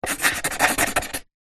office pen felt tip sign signature on thin wood

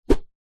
foley wood pole thin swing air swoosh; zapsplat

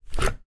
household kettle cordless pick up from base unit

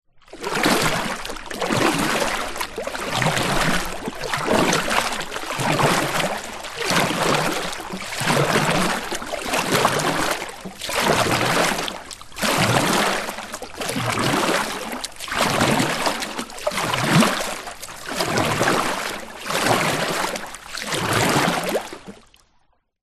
transport boat oar paddle strokes

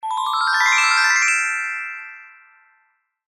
fantasy magic chime ping wand fairy godmother

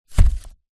pinapple down on wooden surface

